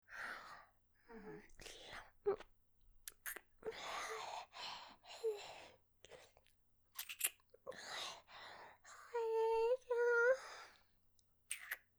キス (ディープキス), ASMR

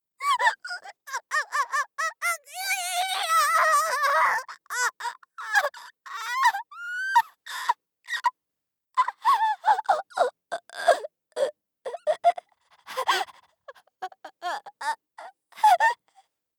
指を焼く; 効果音なし

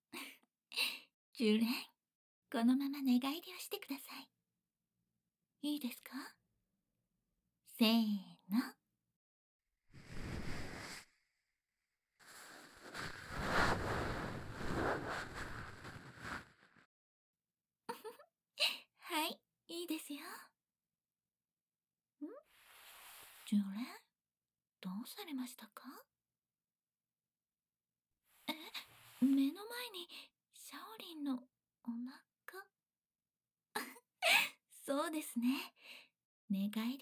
癒しの耳かき 左耳, ASMR

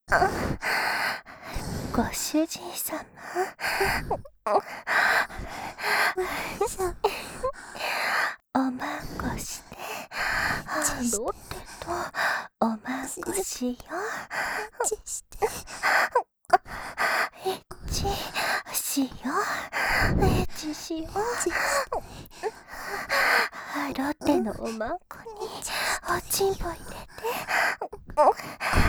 喘ぎ; エッチしよ♪; 静華

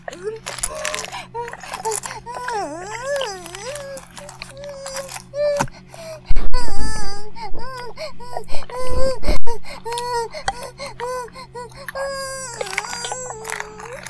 バイブ放置

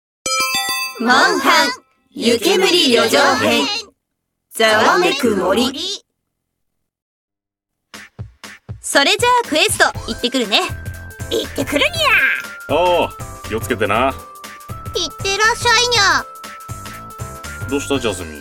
ざわめく森

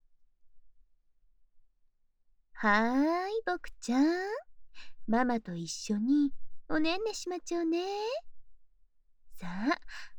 オープニング(耳舐め寝かしつけ）; 左耳var

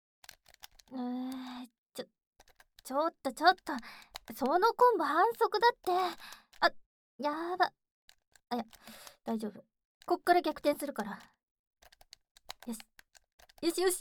耳舐めしこしこのサービスしたげる; フレンド→ラバー

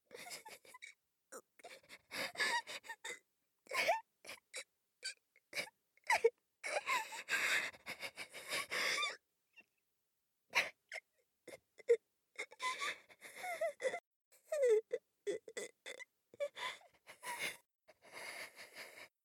嗚咽

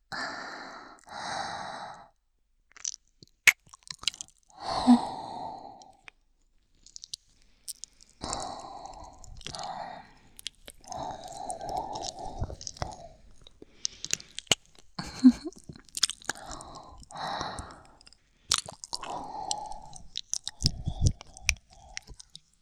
耳舐めのみ 左耳; 本編より抜粋

